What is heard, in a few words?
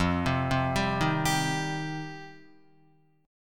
F 7th Suspended 2nd Guitar, chord; arpeggiated; F7sus2; 0,3,0,0,3,3; JGuitar